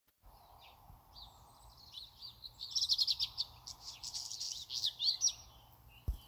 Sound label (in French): Rougequeue Noir Chant